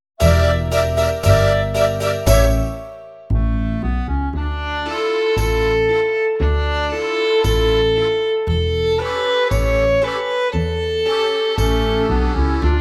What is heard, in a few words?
gypsy fiddle